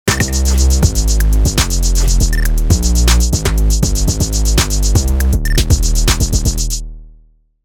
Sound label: bass trap